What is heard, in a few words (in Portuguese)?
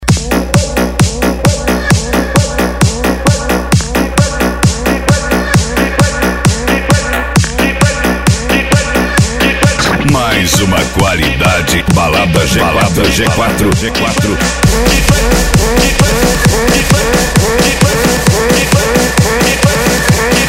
eletro house e eletro funk